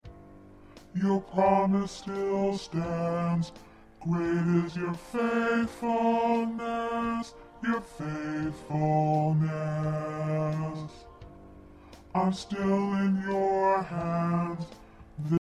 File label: Bass